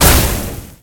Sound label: skill fire explode; agar agar